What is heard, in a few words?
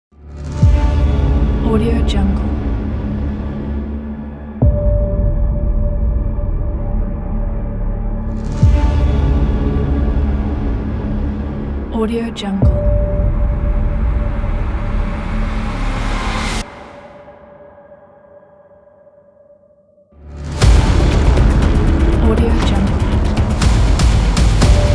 hybrid dark trailer